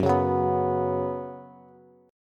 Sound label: Gb Suspended 4th Gb, down, Bass; strummed; JGuitar